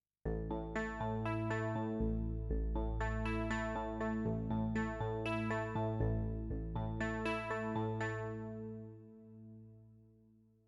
mit Midi Kabel; Vovox

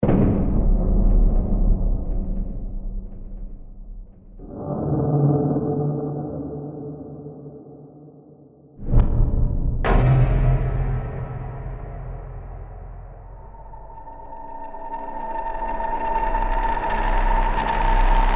Einzelsounds Impacts

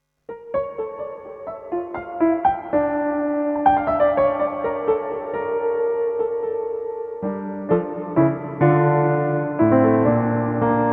Hall; CP1 REV 1

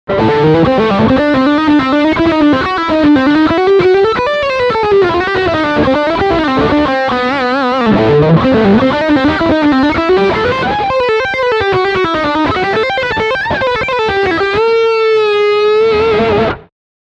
Steghumbucker Leadsound

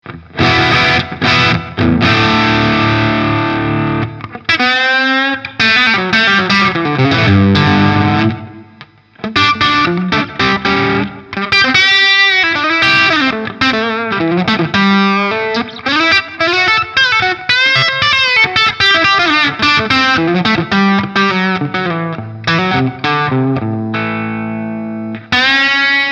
Stegpickup verzerrt

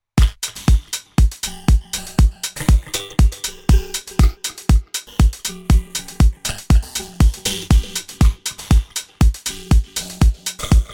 Korg DDD-5 Circuitbent m Fx

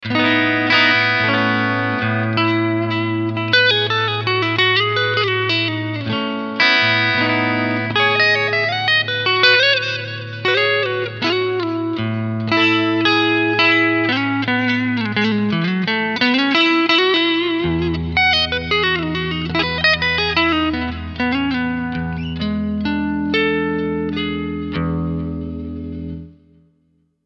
Cleansound Front

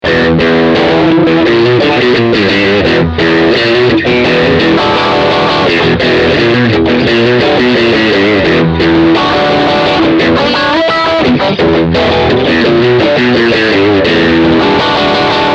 Middle Steg Distortion Riff

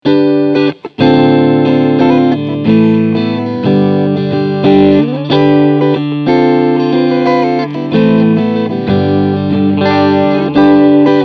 Front und Mitte clean

